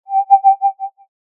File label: coin toss